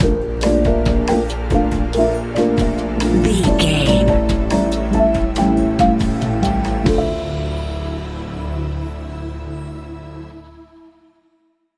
tropical luxury stinger

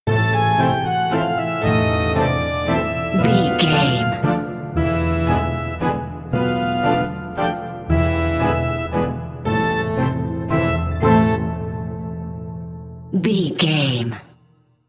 haunted carousel bumper

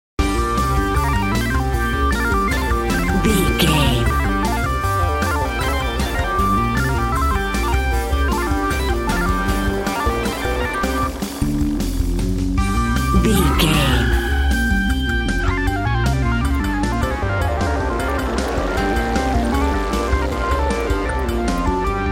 video game boss battle

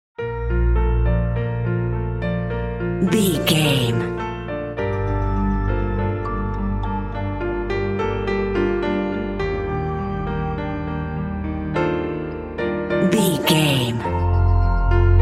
ancient temple ruins

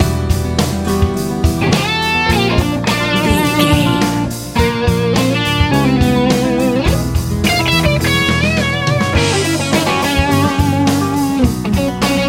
easy rock

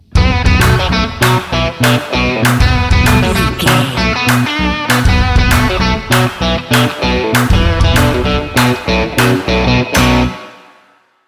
rock machine bumper

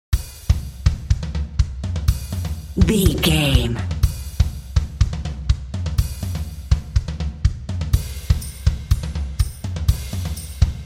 creative drum solo

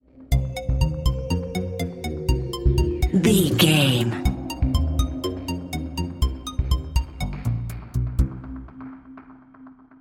mysterious mission video game bumper

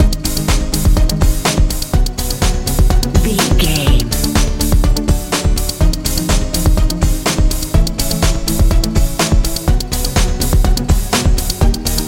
dance pop

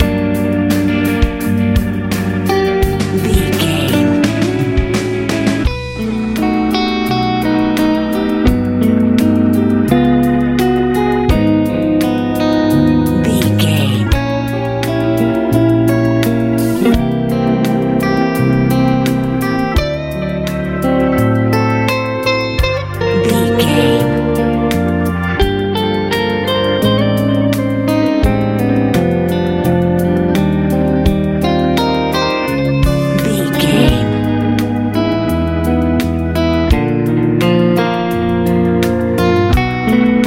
hot happy easy pop

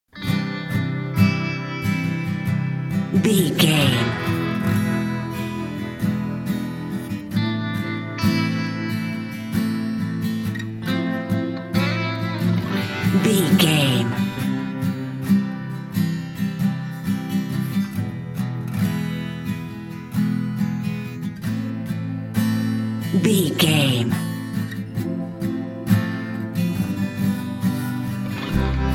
swim upstream quiet start

